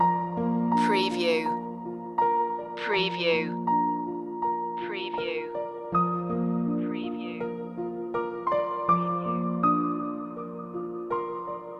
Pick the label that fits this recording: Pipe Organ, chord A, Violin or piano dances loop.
piano dances loop